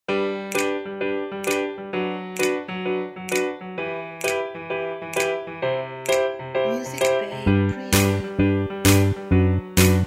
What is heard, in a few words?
Upbeat Happy Piano